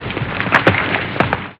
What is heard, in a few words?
Rock Poke; 1916 der unbekannte krieg gamerip